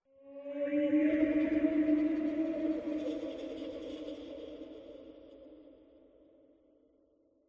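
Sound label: random laughs; xen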